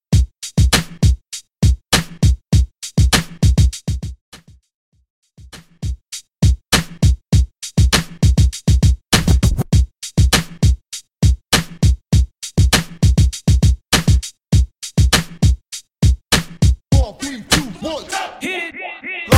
Whistle Twerk Hype No Hype Whistle 100 Bpm; Twerk RMX; Vegas Edit

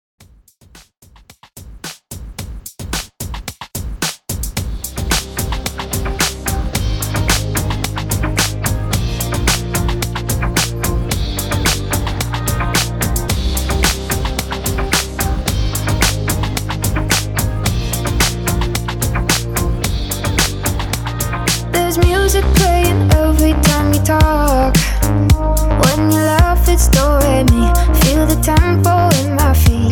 REDRUM 110 BPM

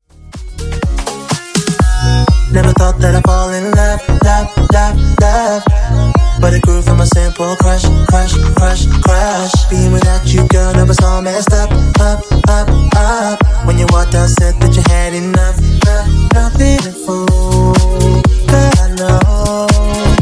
Funk Bootleg 124Bpm

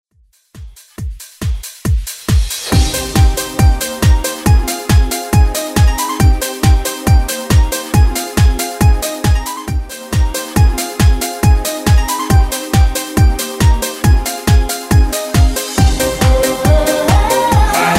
90s Redrum

